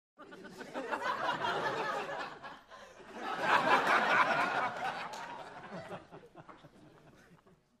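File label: Crowd 100 Sparse Light, medium int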